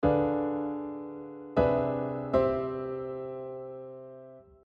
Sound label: retardation + suspension